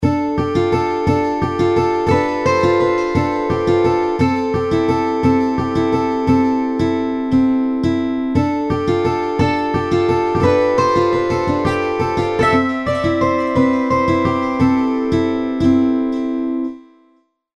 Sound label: Alarm, Six String; HTC